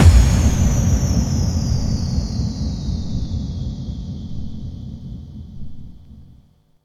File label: Bassdrums FX, FX Kicks; Vengeance Samples